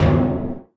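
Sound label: panels impact small, metal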